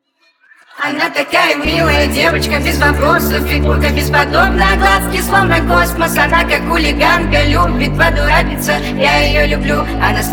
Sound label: phonk remix